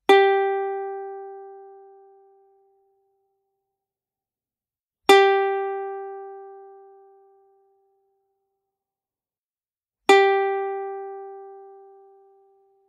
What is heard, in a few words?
ukulele g string sound example